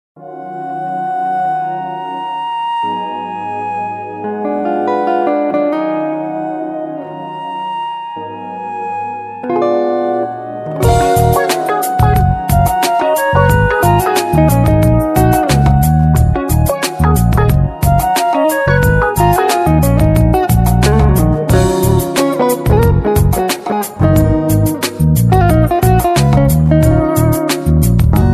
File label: Autumn Groove